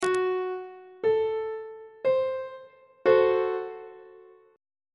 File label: g flat diminished chord; on treble clef